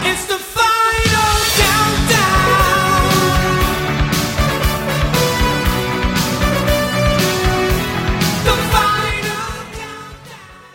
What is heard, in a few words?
win, music